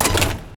door icesteel close; minetest; minetest mtg plus

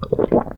drink drinking liquid; minetest; thirsty; breviceps

